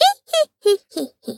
Laugh Witch No processing

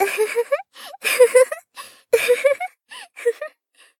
Laugh Long

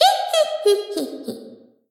Laugh Witch Reverb

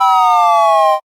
Motion byu-n

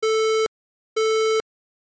pii-pii

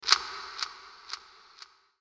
Shock Mental

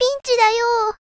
Pinch Voice

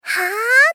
Gentle Attack "Haaaa"

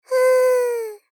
Gentle Rest "Fuuu"

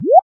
Float powa

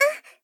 Gentle Damage "Ah"

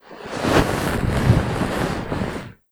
Rustle of Cloth

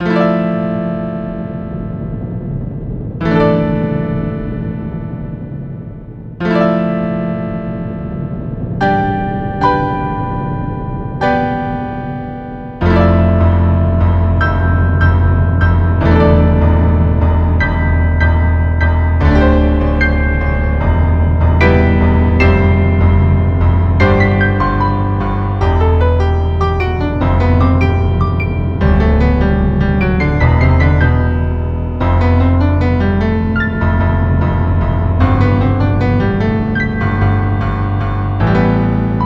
Earthquake Piano

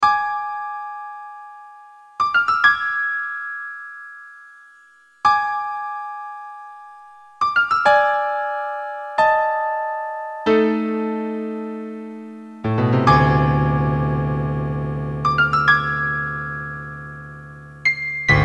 Suspense

